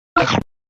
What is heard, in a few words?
bite; battle cats